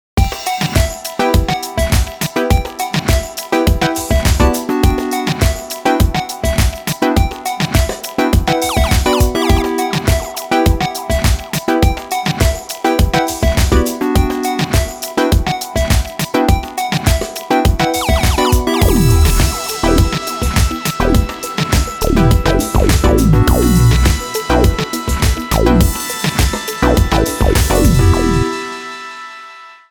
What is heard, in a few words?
SHUFFLE